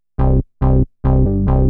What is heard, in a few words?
bass C; Techno; TechnoMusicMaker